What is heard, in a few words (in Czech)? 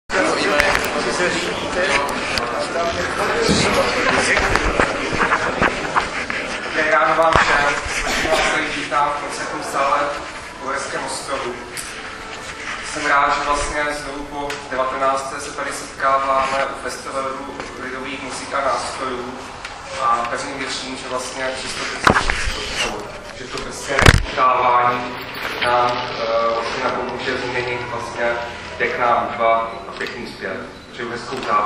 lidova muzika; Ostroh; Zvukový záznam z Uherského Ostrohu - 11. října 2009; koncertu lidových muzik; Hradiště, Strážnice a Maďarsko v Ostrohu - zvukový záznam koncertu lidových muzik